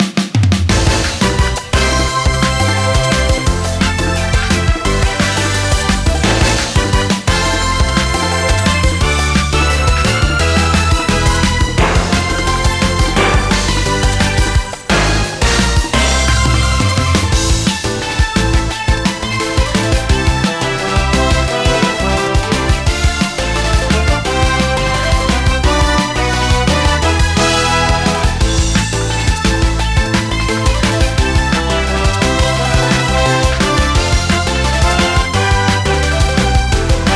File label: Demo Music bpm173.20; KG BunbukuRobo